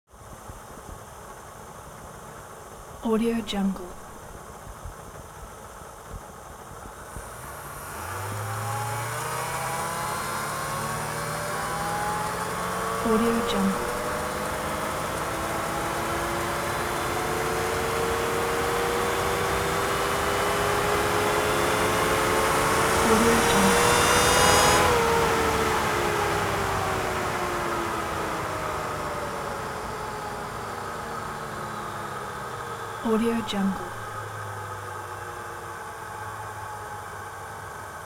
transportation vintage car chevrolet 57 accelerate steady engine